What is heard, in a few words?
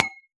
Moody Park Playground Perc; Houston; percussion